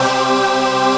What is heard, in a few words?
VOICE PAD; Best Service; XX Large; Pads